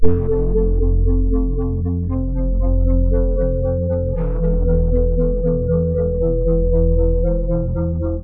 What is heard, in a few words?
Synth Poly